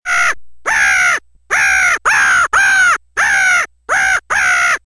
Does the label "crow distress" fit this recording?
Yes